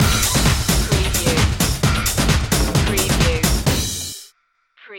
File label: brazilia